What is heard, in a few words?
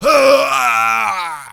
Death Sound, Seaman; Heroes3